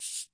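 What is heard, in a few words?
classic hip hop shaker; STYLE KITS (Hip Hop, Etc); Classic Hip Hop Mega Kit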